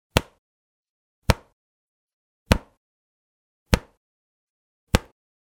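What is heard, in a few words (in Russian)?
звук резинки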